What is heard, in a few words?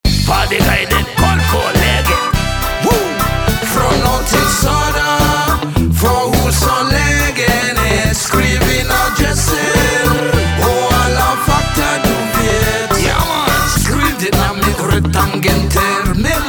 Reggae